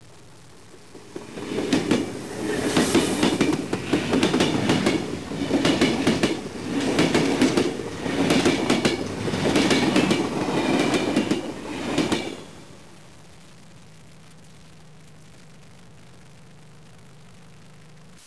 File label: train by